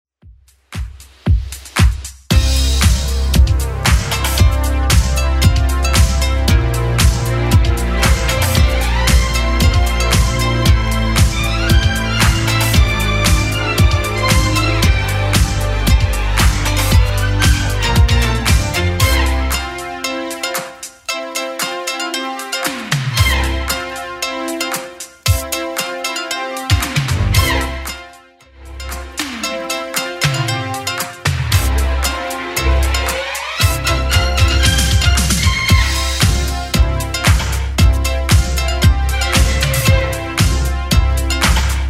80s Club Edit 115bpm

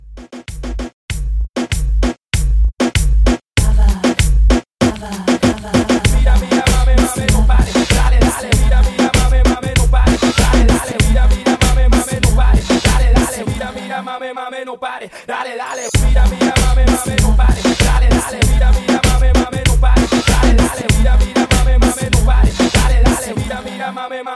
90s Hip Hop Rap Reggaeton 97 BPM

